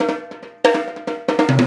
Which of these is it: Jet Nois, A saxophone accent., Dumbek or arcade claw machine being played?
Dumbek